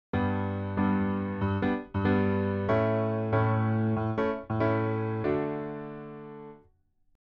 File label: Piano Stereo